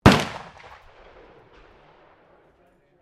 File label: RIFLE MOSIN NAGANT 9130 FIRING DISTANT; Rifles, military; Rifle Sound Effects; Guns